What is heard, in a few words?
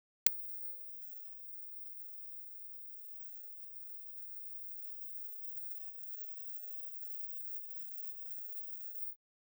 sound of an electric proj, deflection